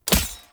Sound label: Throwing Knives Impact Armour; Weapon Impacts; Player; Combat